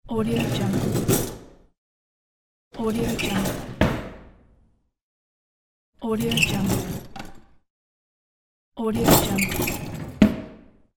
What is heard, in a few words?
kitchen drawer